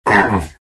pain, vo; arc warden

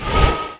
preattack; dota; crystal maiden

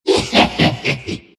nyx laugh; dota; nyx assassin

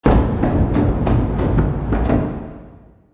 drums

